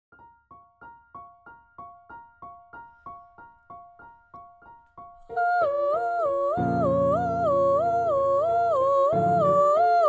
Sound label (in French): Ouverture Sop